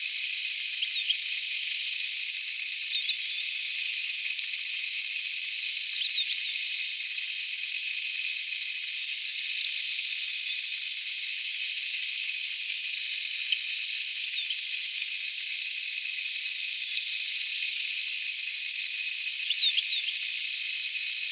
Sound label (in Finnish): taviokuurnan aantelya